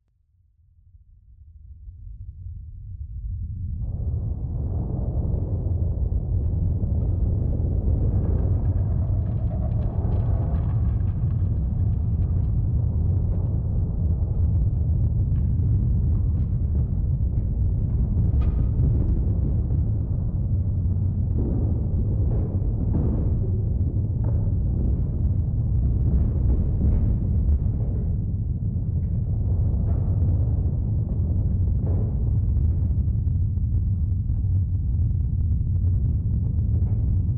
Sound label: Hurricane from basement